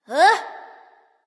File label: nv confused; cupid